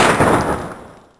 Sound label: storm; hyperrogue